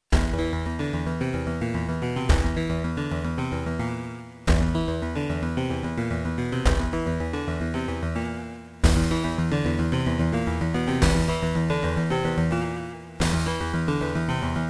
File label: Beepblaster 2.0 BEEPMODE; Doom - E1M1